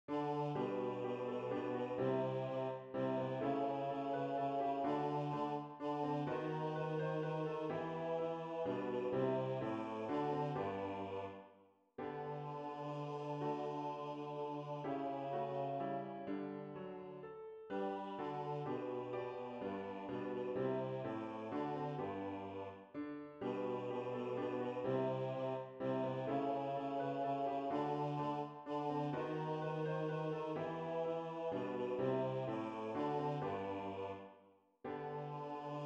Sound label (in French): voix basse piano; traditional; wish you merry christmas